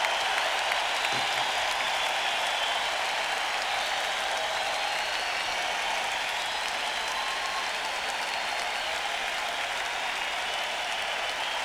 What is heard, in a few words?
Encore Break; nak300